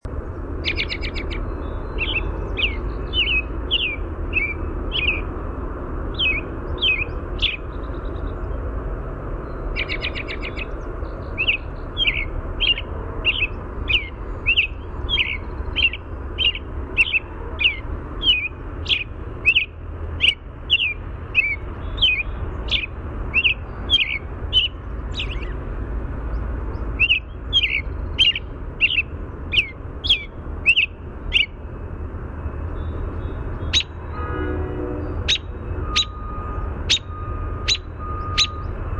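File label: American Robin alarm chirr song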